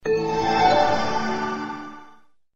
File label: Magic Chime